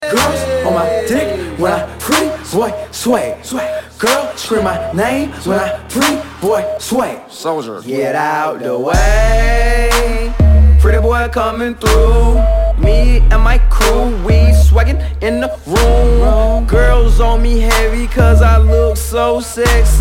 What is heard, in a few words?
Hip Hop